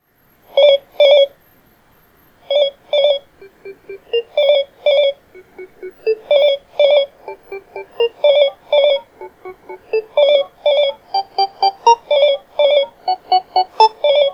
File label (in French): sonnerie snom m3 denoise